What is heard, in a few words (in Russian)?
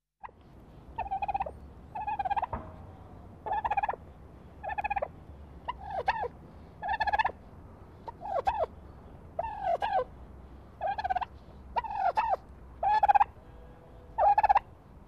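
индюки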